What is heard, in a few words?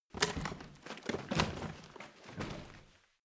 rotten wood handle; foerster